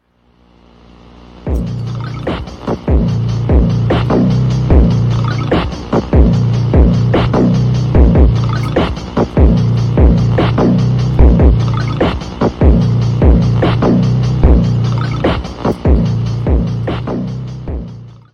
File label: smoke cowbell cult phonk